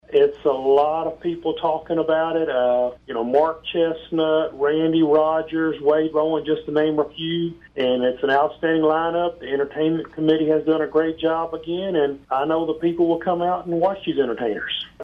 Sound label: music; austin co fair